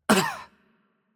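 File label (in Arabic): Hurt Light, Arabic; ar AE; VO; bloom minigame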